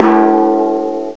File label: TIMP; CMI Sound Library Rev 1.3